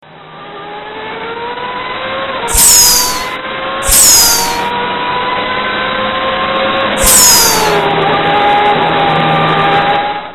Turbo blow off

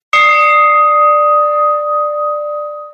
real temple bell; ringtones; sms

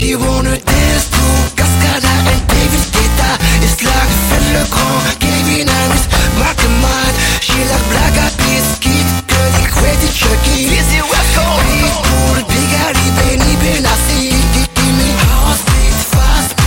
Electro

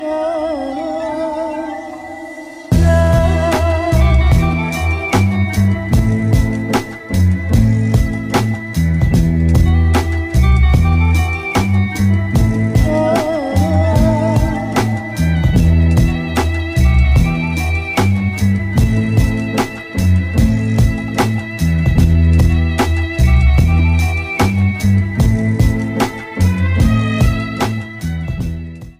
Deep Hip Hop Soul

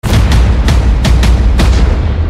Drums

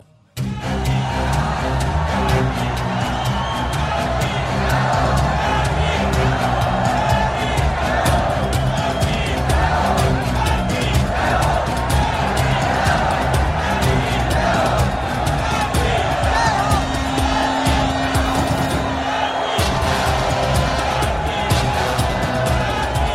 sword

